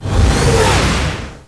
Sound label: warp